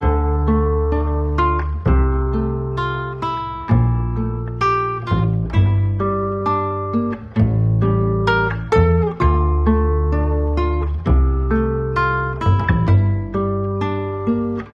guitar heart-wrencher, Loops, Sad

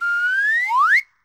whistle up

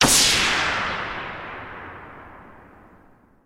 kwash; Zaps and Beeps

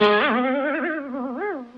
boing

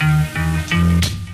keys, Phonky

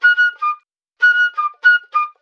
flute, Loops Phonky

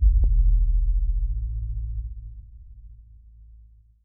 dull drone; Rumbles

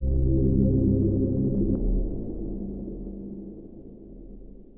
puke, Synths

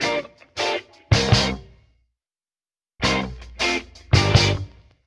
guitar start and stop, Loops; Phonky

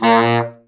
sax; Winds

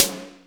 server, snares real

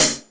Hats, Real Closed

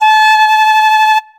flute; Winds

